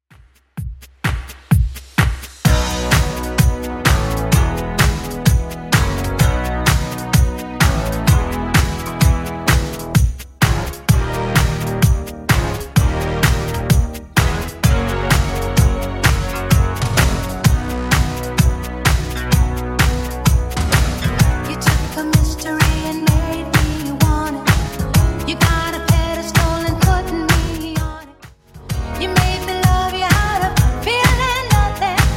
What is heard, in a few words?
80s Redrum 128bpm